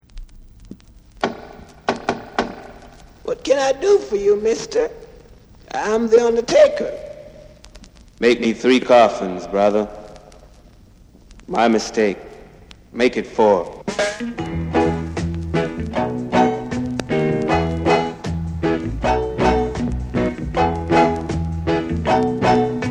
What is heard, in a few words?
REGGAE INSTRUMENTALS